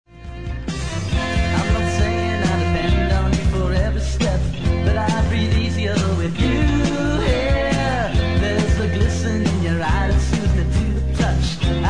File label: Ld Voc